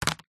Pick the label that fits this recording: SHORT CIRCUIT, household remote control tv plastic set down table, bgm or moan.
household remote control tv plastic set down table